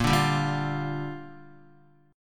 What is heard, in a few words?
Bb 6th, Guitar; Bb6 strummed